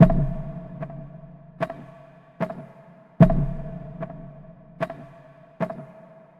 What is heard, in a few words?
Percussive hit Tension Loop 75 bpm; MatiasMacSD; Retrace